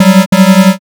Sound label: wrong